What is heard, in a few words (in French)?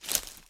pas; deadmaze; bruit